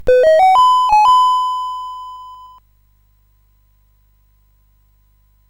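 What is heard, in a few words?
Mr Wiz, MOS 8580 SID chip music, games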